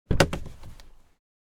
Car door open interior

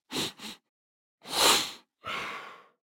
Human smelling inhaling

